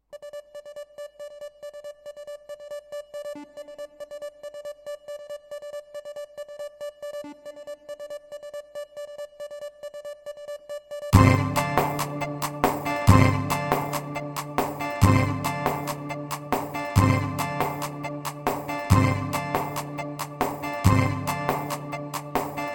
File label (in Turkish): pa-500 tr roman meyan ritim